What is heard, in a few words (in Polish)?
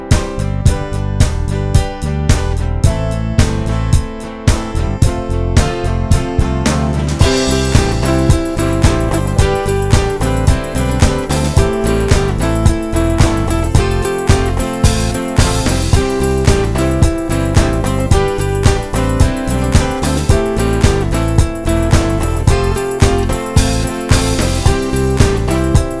Podskok INSTR